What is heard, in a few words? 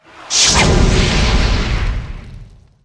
Supernova Target